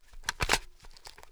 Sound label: cf05 mag out; weapons; garrysmod